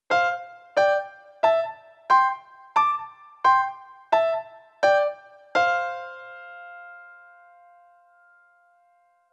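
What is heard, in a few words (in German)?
CHINESISCHE QUARTEN c-f trumpet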